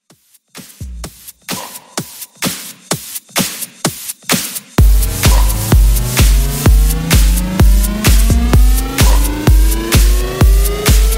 Melbourne Bounce Re-Drum; remixplanet

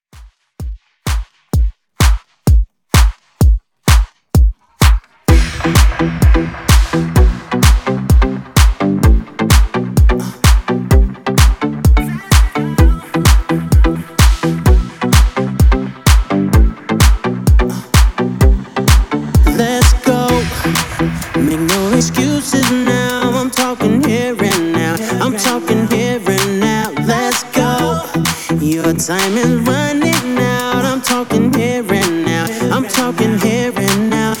Re drum

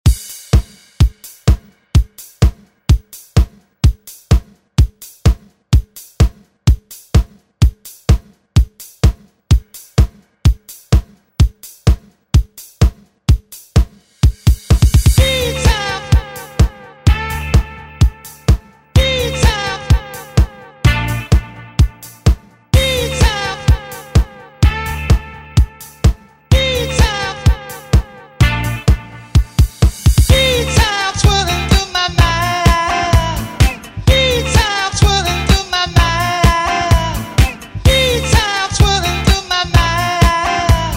70s Re Drum; DJ Mhark

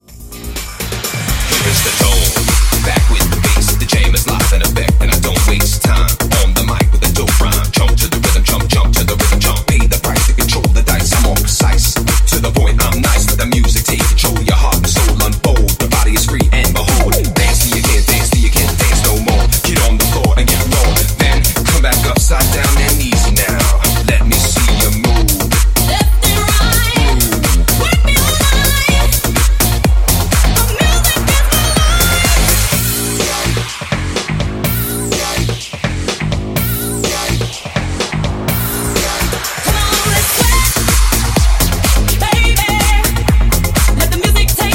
125bpm Bbm

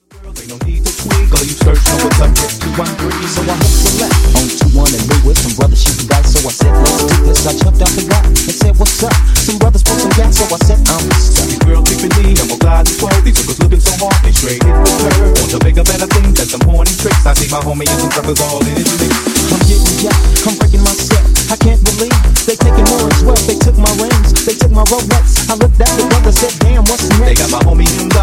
Funk Remix 120Bpm

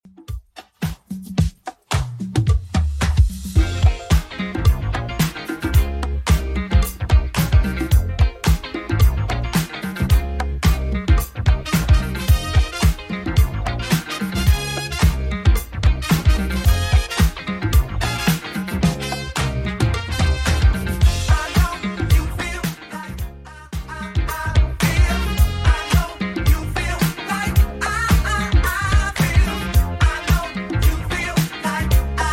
80s Redrum 110bpm

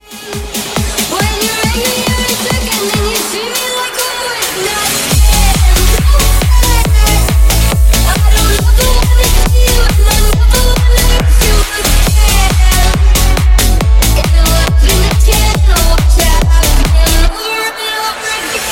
Slam End 138 bpm A

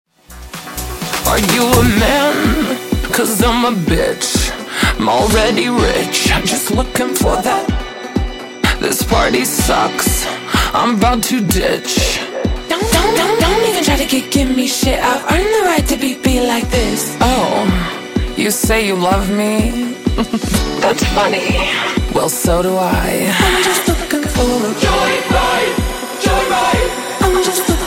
Funk 126Bpm

